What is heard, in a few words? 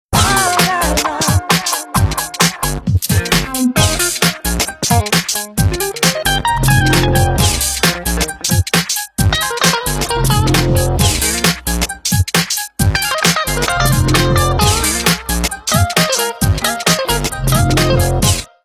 Dance; Motorola; RAZR-V3xx; Vodafone